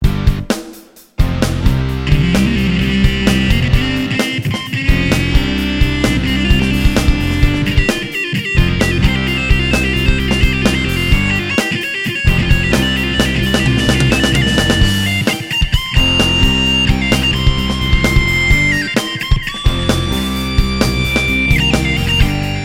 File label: xv88 quick mix remix